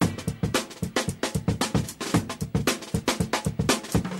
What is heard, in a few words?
funky break